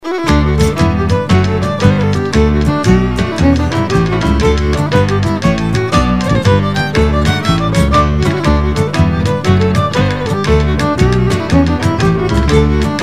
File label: Jig